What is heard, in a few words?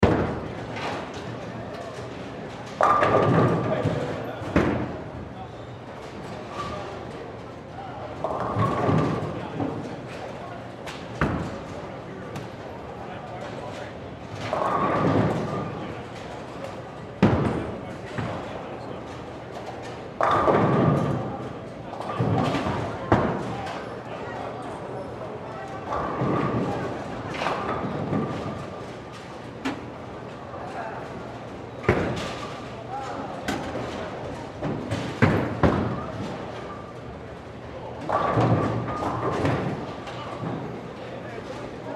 Bowling Alley; Bowling Alley Loop; Sports